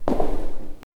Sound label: extremely heavy slow, reverberating